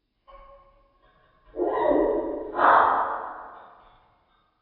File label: bird budgie song; dfcaverns; df ambience